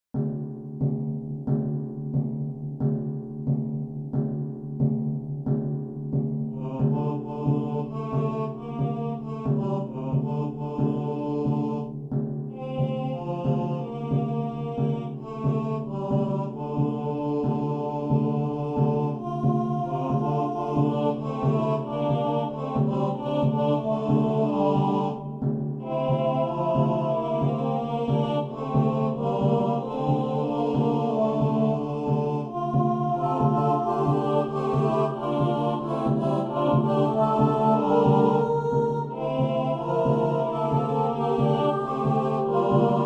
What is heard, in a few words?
night song